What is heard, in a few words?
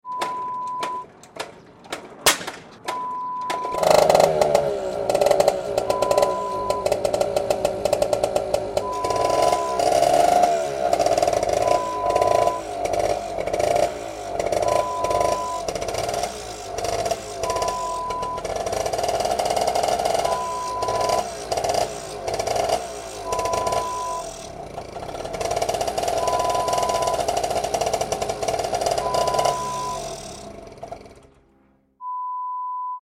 Motorcycle sound